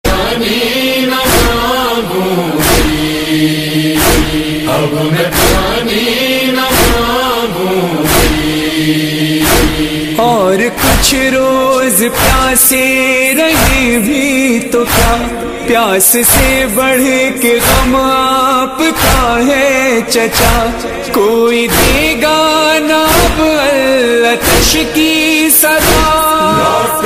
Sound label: noha tune ringtone